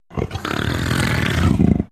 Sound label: Leopard Snarl Raspy; big cats